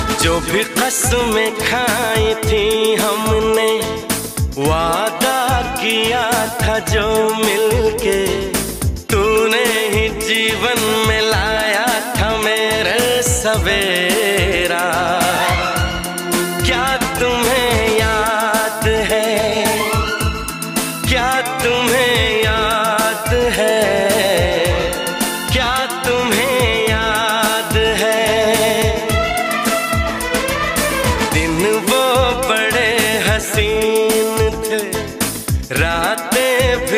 Old Bollywood Movie Song Ringtone; Raaz Movie

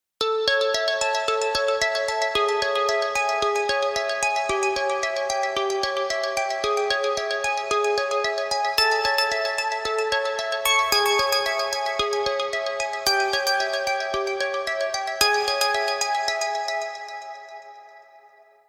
Soft Instrumental Ringtones

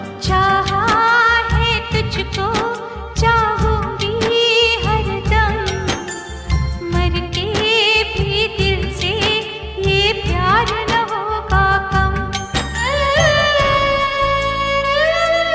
Hindi Female Ringtone

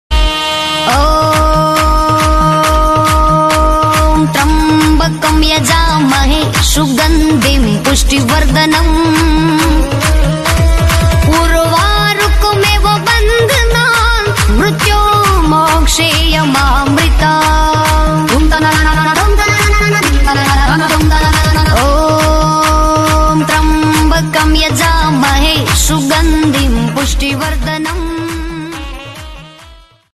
Om Tryambakam Yajamahe Ringtone; ringtones

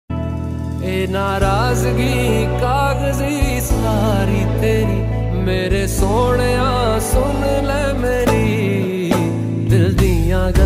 Most Romantic Punjabi Song Ringtone; ringtones

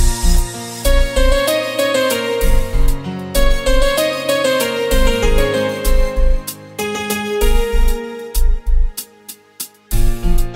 Music Tone; ringtones

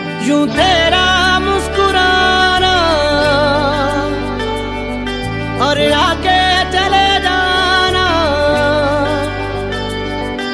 Love Hindi Song Ringtone

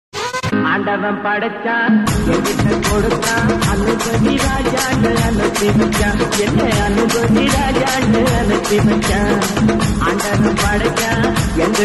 Remix Ringtone

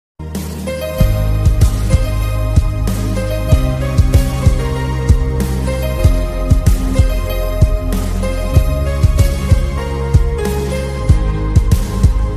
Ringtone Instrumental

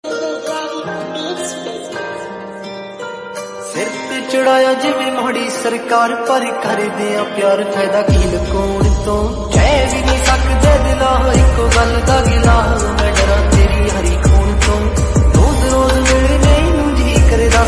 Lofi Remix

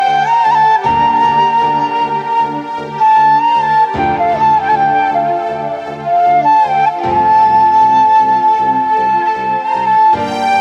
Flute Ringtone; ringtones

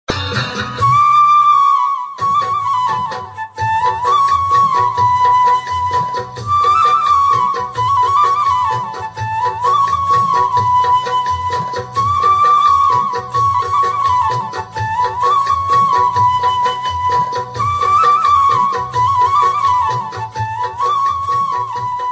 Flute Ringtone

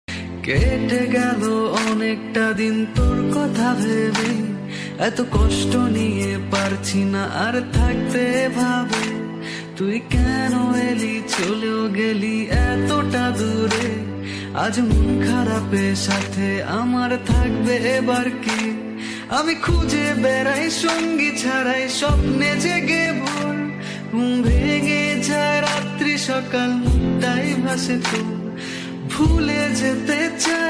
Sad Song Ringtone

